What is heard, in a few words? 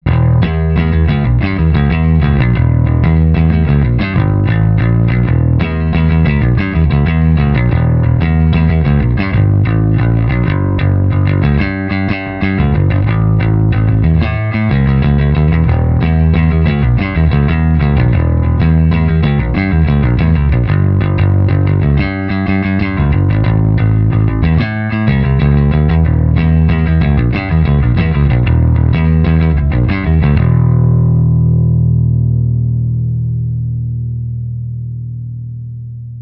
Little Bass Thing Pick